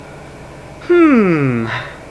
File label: ponder, People; Sound Library 2000; Wayzata Technology